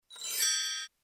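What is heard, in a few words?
press button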